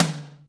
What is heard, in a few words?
AMB T, HALL KITS; Crypton - DrumBase5000